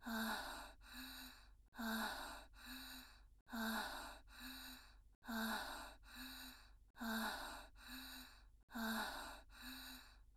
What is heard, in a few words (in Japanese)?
吐息